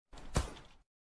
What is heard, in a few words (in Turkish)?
Deagle Bildirim Sesi